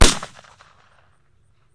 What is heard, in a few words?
SHOT; Deadly3D; E-MU Producer Series Vol. 5 – 3-D Audio Collection; 3D Percussives